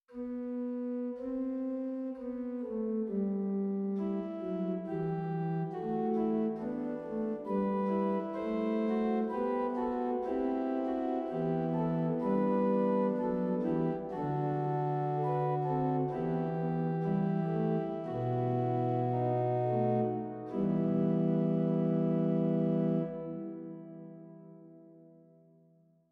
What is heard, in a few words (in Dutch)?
Rugwerk Praestant 8; zwolle